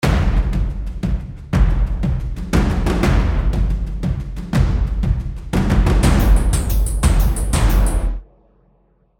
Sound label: Loop Designer Full Breakout Loops